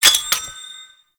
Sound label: Grenade Pickup; Kahl; Warframe; Veilbreaker